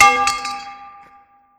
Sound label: Bowl Hitting Ground; Feed The Beast